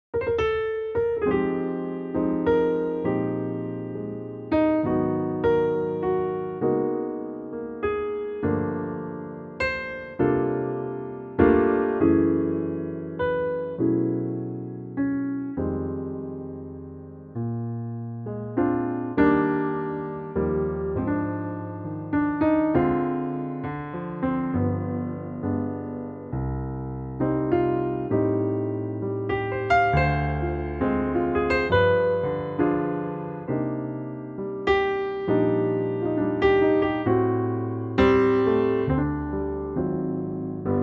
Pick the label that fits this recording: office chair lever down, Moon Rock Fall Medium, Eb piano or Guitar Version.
Eb piano